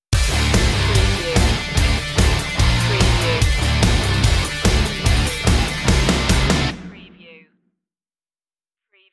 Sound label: kill hit stinger